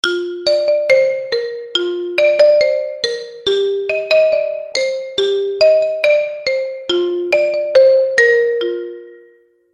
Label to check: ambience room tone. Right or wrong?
Wrong